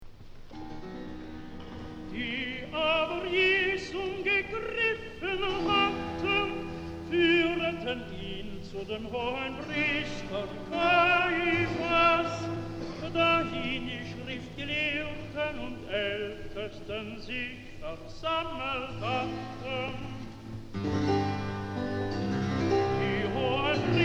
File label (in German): rezitativ; Bach, Johann Sebastian; Matthäus Passion; die aber jesum gegriffen hatten